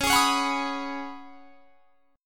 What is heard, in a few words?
Db 7th Suspended 2nd Suspended 4th; 21,23,23,23,24,23; Db7sus2sus4 strummed